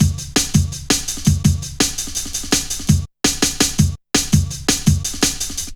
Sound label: Fat Rolla, Jungle Drum Loops 166.5